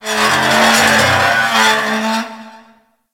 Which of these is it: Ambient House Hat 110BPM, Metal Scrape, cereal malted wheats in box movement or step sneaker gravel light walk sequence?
Metal Scrape